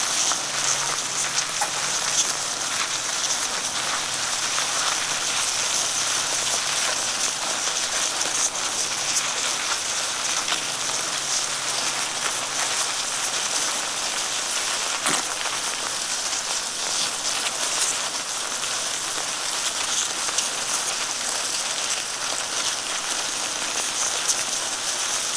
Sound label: worms, field recording of a live mealworms; soundscapes; dpa